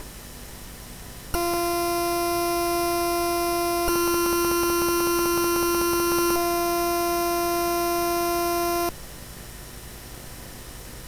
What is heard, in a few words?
buzz